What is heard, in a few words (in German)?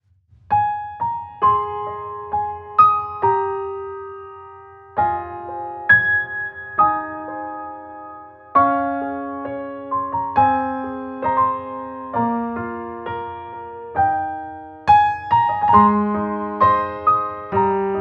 Weisst du wie viele sternlein instrumental